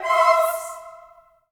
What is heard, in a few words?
choir